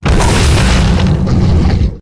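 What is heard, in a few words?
wolf spawn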